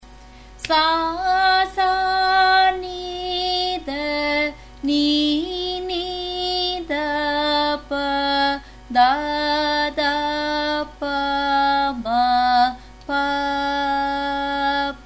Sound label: Sarali Varise F sharp; Sarali 12; Sarali Varisai; Carnatic Music Basics